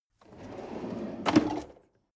drawer medium open draw short

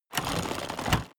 crossbow load

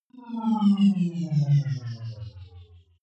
wobble drop hover

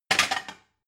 plate cupboard take hard

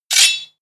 sword knife pull out

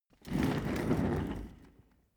office chair trundle fast long

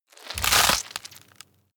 rip bone and flesh

